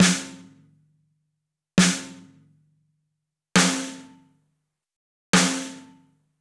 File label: snare rimshot